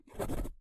scribble; More Level Content; Barotrauma

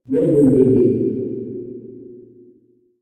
crab; Barotrauma; Barotraumatic; Icecrab

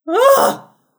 grunt; Barotraumatic; Clowns; Clowndeathmask

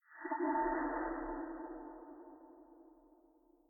Ambient; Barotrauma; Subsurface

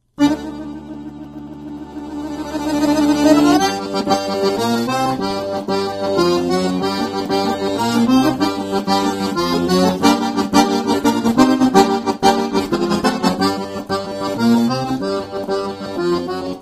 accordion; Barotrauma; Epic accordion

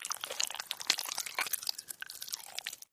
worm; Barotrauma; Barotraumatic; Seasnek

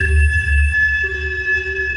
sonar Ping Water Far Shuttle Directional; Barotrauma; Real Sonar

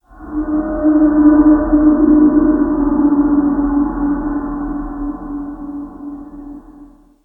Distant Pod, Ambient; Barotrauma; Room FX

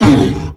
attack claw; Thalassophobia Restored; Humanapostle

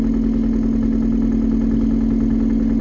drone multitool hum; Weapons; EK modern; Barotrauma

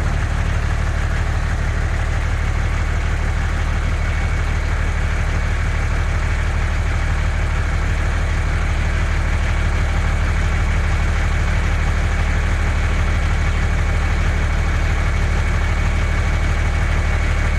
engine; The Avali's Audio Overhaul

